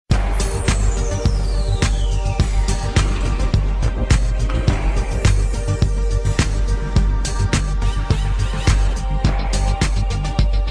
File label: motorola classic ringtone